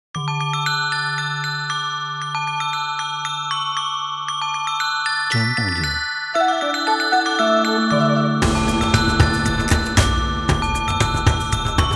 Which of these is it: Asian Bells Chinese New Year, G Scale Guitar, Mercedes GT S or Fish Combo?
Asian Bells Chinese New Year